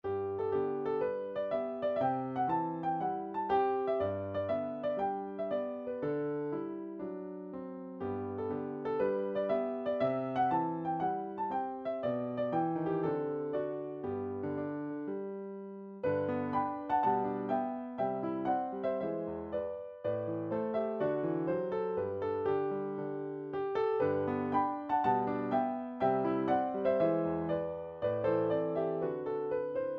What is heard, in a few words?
piano